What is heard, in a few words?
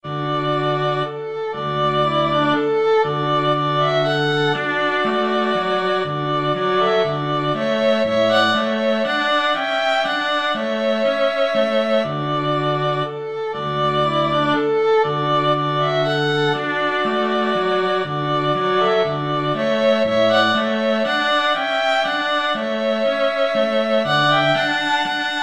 2 violins cello; handel menuet